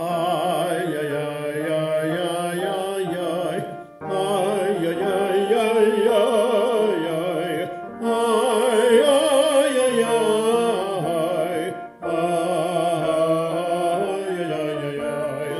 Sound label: Rosh Hashana Melody